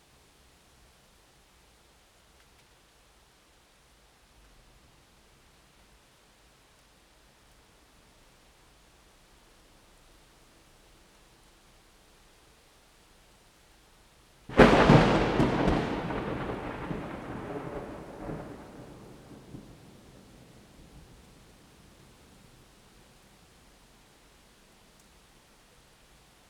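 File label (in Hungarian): különleges erősebb csattanó, mennydörgés; angyalfold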